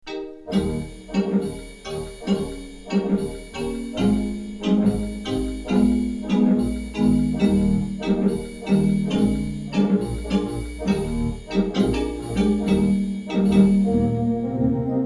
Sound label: Tango, theatre organs; A theatre pipe organ